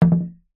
foley glass window object knock bounce rest

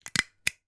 stapler push down; office

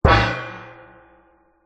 impact metal lid trash gong like; zapsplat

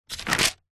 book telephone directory page turn fast

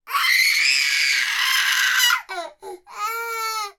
human 18 month toddler boy scream

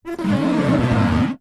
plastic tub hand rub wipe squeak wet single; foley

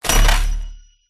Ice Spell Cold Bash; Sound Spark

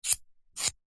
foley metal slider small slide; zapsplat

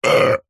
human male man burp hard forced wet vomity

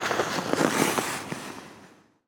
Ice Skates Coast; Blastwave FX

